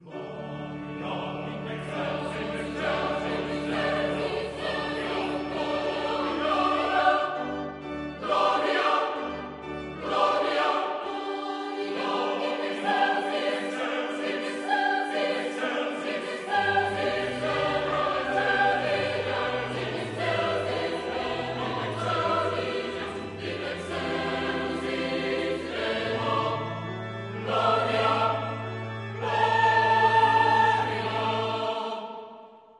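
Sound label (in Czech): Mše D dur, varhany, dětské hlasy, smíšený sbor - mužské hlasy; 1887, 2.část-Gloria; Antonín Dvořák; Mše D dur "Lužanská" op. 86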